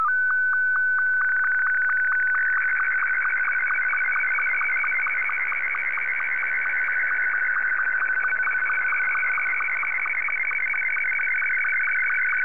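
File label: slow-scan television; M2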